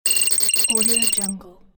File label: data glitch